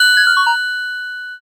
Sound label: ice magic